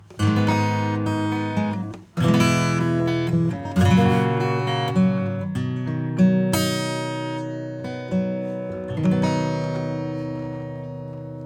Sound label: acoustic bypass; Lindell X